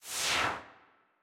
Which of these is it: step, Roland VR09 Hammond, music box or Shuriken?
Shuriken